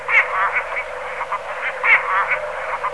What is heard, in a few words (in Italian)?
Marangone minore